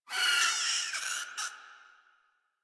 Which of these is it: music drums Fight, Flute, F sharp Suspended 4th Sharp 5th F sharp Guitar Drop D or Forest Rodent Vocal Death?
Forest Rodent Vocal Death